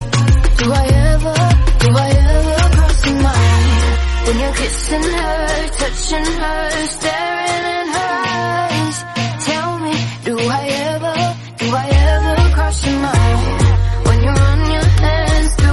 English Song

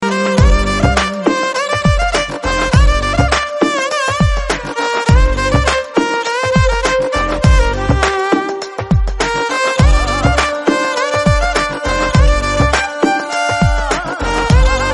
Song Bit Version

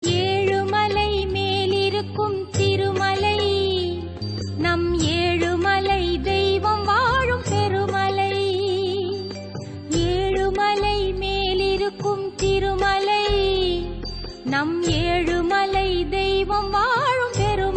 Lord Perumal Song Tamil Song

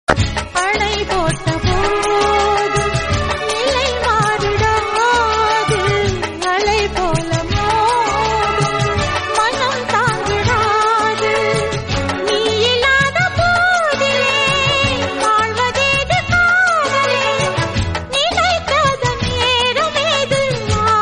Romantic

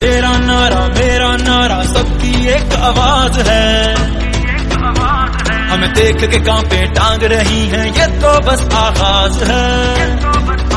Pakistani Song

